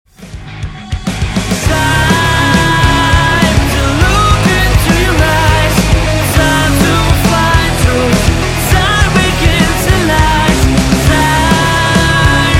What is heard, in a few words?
cool time music ringtone